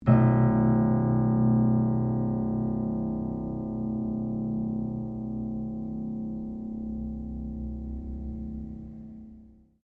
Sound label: PIANO UPRIGHT BROKEN STRIKE, Upright piano without keyboard; Pianos (Sound Effects:Musical)